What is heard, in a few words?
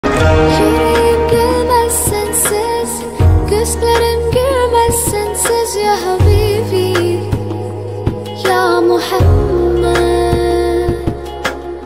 arabic ringtone